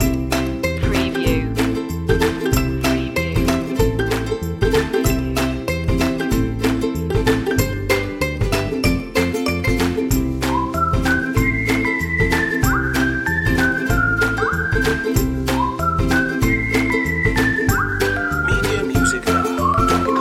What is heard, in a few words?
happy and funny loop